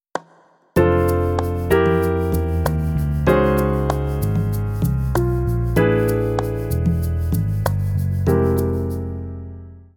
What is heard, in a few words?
Natural Elevenths